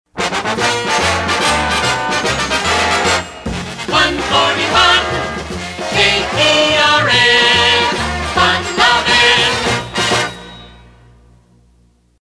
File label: Trombones Vocal; PAMS; KERN